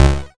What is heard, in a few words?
YM Bass C#, chip; ChipShop Deluxe; ChipShop Hits